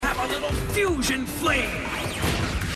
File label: Flame; Prowl; Fusion; Robots In Disguise television show